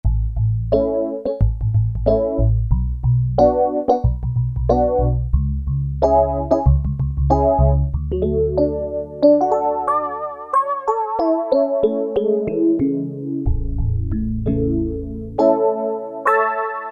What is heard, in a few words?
D C O Wave, Roland MKS-70